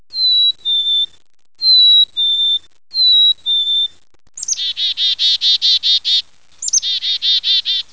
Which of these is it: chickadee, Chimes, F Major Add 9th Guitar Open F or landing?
chickadee